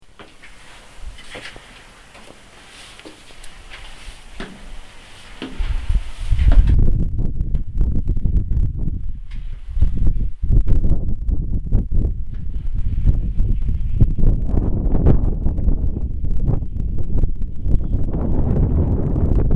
steps

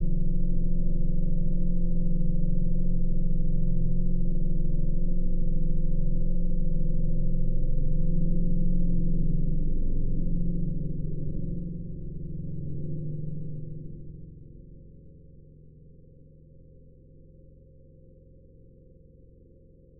electric gamelan ENGINE NOISE

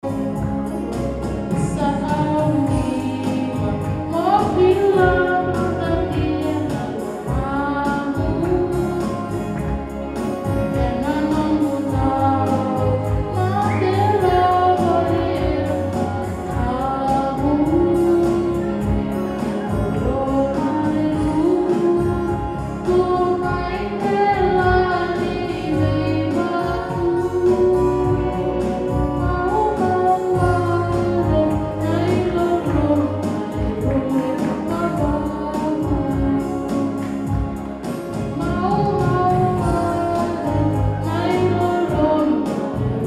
karioki, Vietnam; 2019

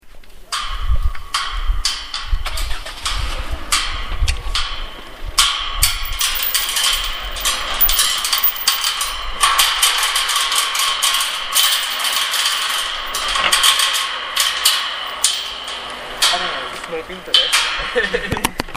metalic rattles

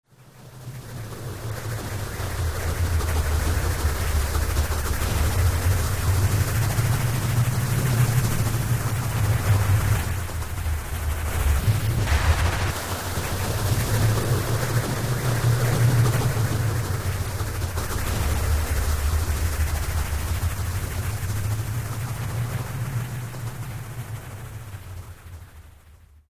white noise phased bass

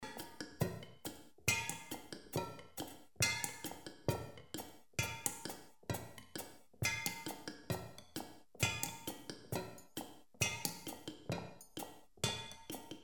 Angka Telu, electro gamelan

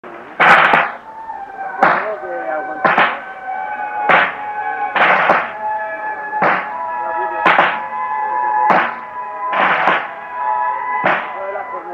shrine dictorphone mexico, street recording

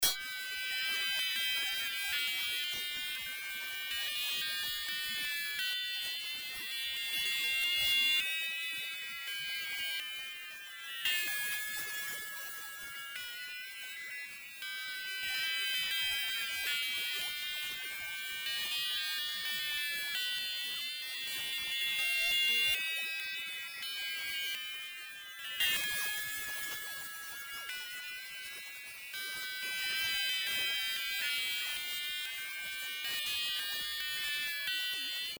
electric gamelan ALIEN GAMELAN

